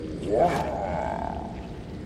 waahaa loud

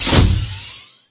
lever sound; lego star wars

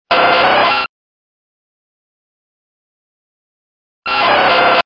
tentacool cry

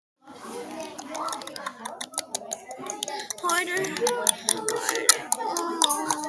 clapping loud

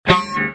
fail guitar hero quiet

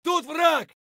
tarkov chvk tut vrag scream

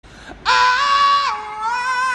ahh scream

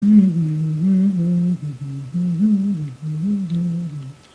mmmhmmmhmmmh

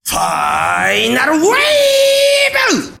vocal track

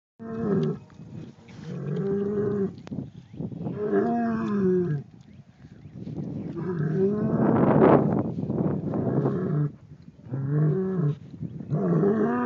angry bull sounds

